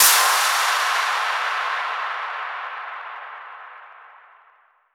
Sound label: Fx Impact Space; bass house; fx vocals